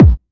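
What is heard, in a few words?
Plastic Bass Drum, Drumset; Roland SH-32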